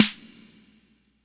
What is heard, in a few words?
huge snare g sharp key; snare drum samples; one shots